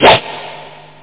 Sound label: vynil, scratch; da capo vol1